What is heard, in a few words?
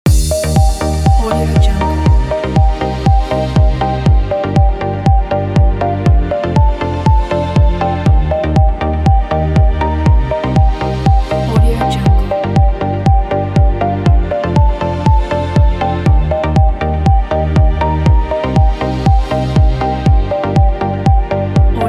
beautiful fashion background